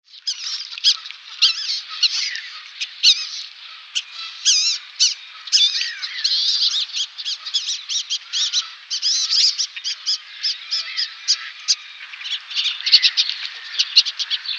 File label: Wood Duck male display